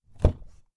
porta abrindo

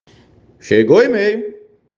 chegou email

